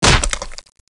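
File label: SFX tentacle explode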